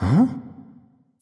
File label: confused; smite; khepri